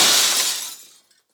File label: window break